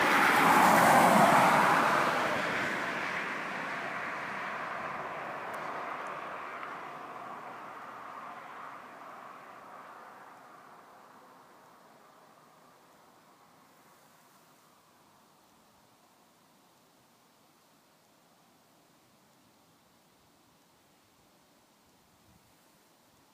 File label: Getting to edge of the road